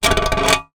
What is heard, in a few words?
industrial shovel metal drag hard ground; zapsplat